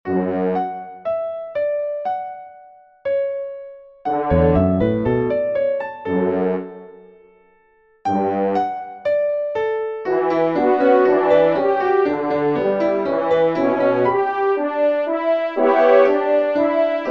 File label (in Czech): lesni roh a klavir